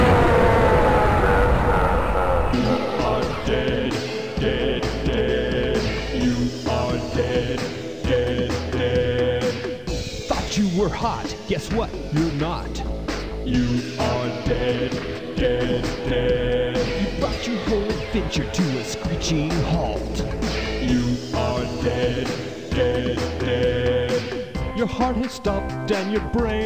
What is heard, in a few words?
fail sound; Doomsday v3.0 green; Toonzz